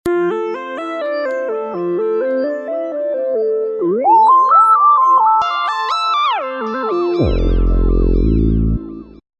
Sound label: Ambi Lead, Roland V-Synth; Factory Patches